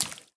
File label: Land Shallow Splash